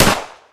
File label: dist, weapons; Source Engine; video game; ar1